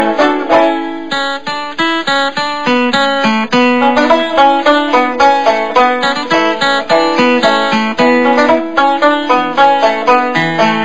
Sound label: banjos hill billy background music tone ringtone